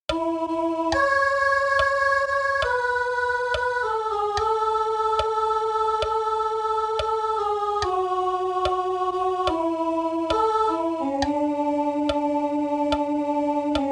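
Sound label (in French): Comme Lui, soprano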